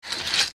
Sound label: Bed curtain slide squeaky rail open; blinds